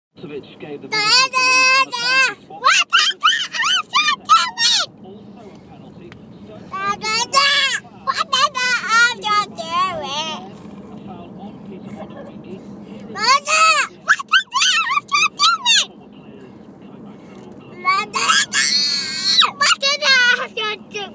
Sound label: more backseat chorus